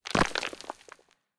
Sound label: debris; Smite; Cabrakan